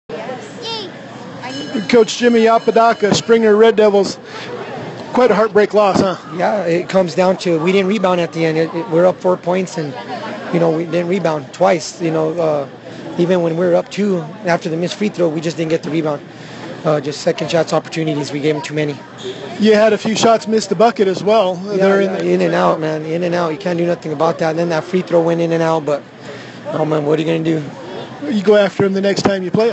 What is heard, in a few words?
Cowbell; Springer 2nd Place